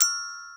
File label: gloken